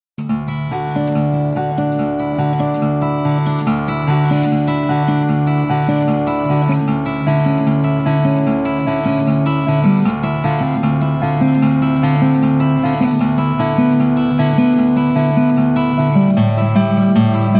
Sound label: Guitar